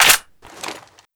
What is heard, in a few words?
styaug reload clip out, foley